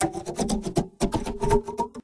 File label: instrument nukulele lead